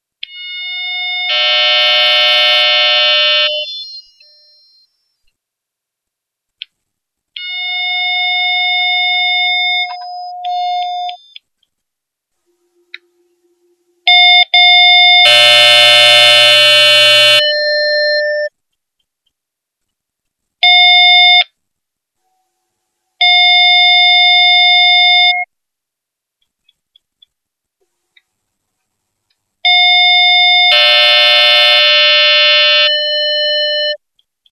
Klang des SAE800

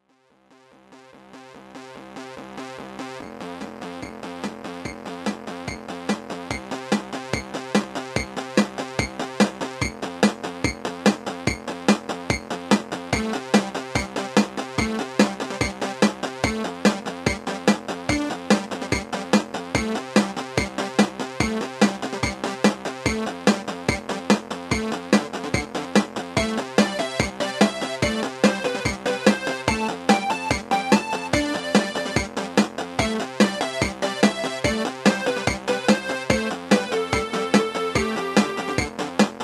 dingding, Tonleiter mit acht Tönen; elektrischen Orgel

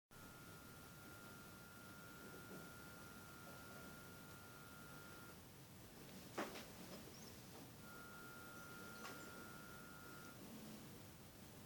Stoerung Rundsteuersignale

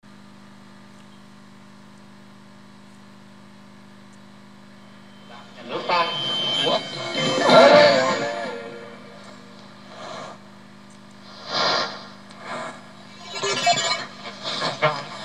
Audio Pendler

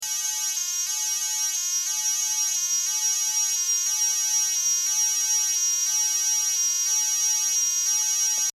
wecker

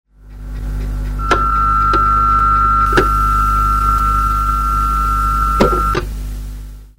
1350Hz Schalter

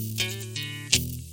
schnarren, Störgeräusche; mobile Musikbox mit integriertem 4-Kanal Mi

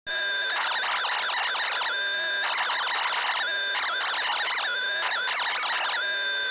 SSB Klopf

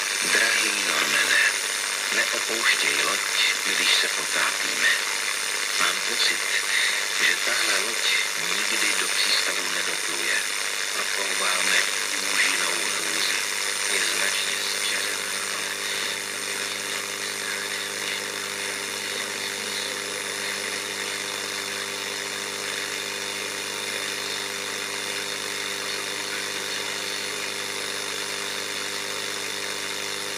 Kleiner Detektor

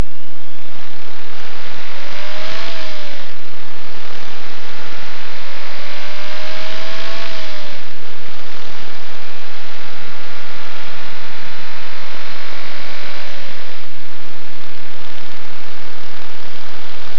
Klopf

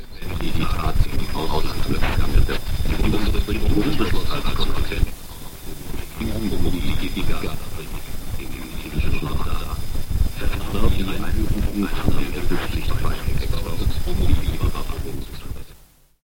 Auroraeffekt

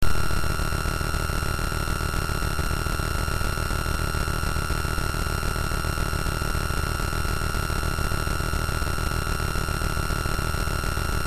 Stille falsch

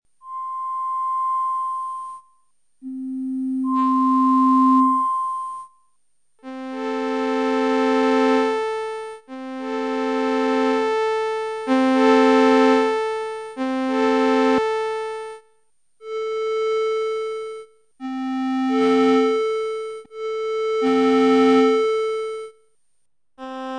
Toene

